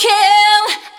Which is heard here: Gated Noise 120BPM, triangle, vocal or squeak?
vocal